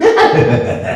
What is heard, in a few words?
heh heh ha